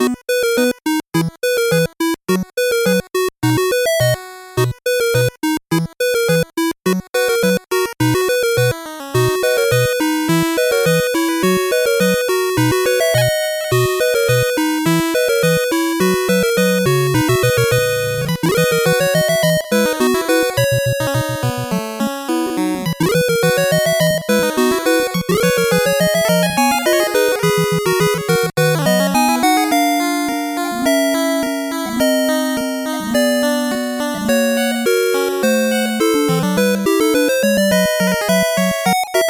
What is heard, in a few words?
8bit bgm fast